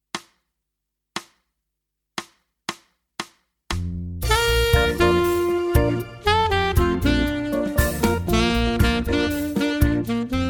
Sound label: Banana Boat Alt; Alt-Saxophon in Eb-Stimmung; Play-a-longs